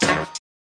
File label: Hit; Punches Hits Discoblasts